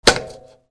CLICKS

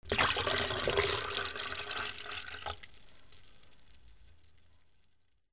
KITCHEN

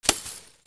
BREAKING

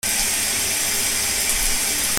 BATHROOM

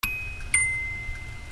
CARS

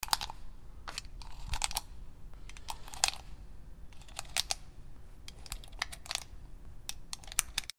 WEAPONS

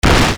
BANGS

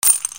MONEY

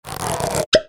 CARTOON

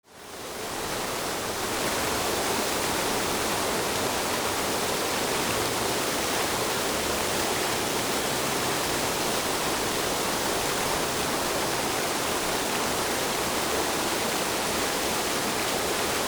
AMBIENCE

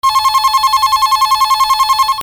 PHONES

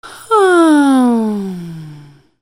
PEOPLE

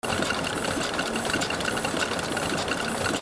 INDUSTRY

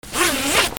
RIPPING

